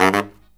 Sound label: Killer Brass Riffs; USB Soundscan vol.29 - Killer Brass Riffs; AKAI; BARYT